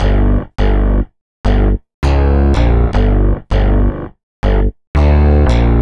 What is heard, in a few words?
Bass, Synth Bass Loops